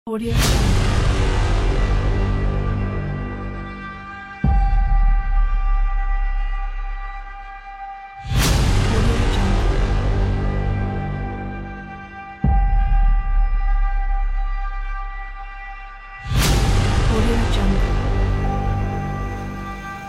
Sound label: aggressive cinematic trailer